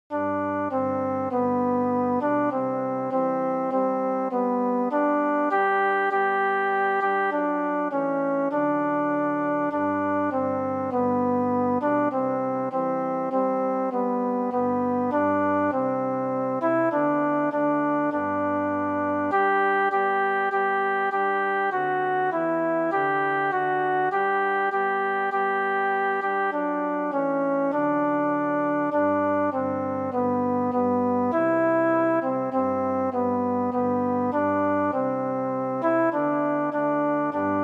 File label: alto